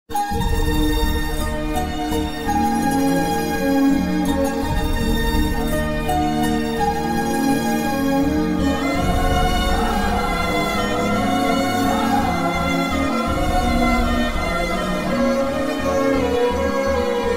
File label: bgm, background music, violin ringtone, south movie ringtone, flute bgm; Manam movie